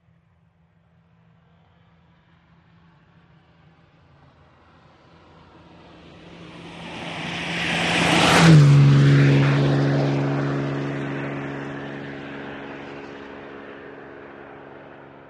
VEHICLES ASTON MARTIN: EXT: Pass, fast.

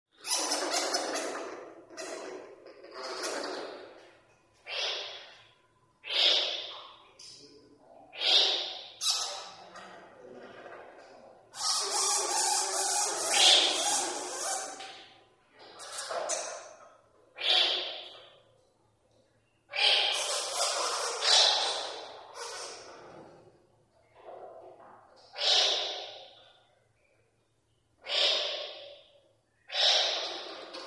Bat Mountain Squeals, bats

